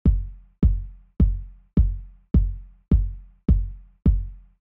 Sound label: Kick L; Remix Sample Pack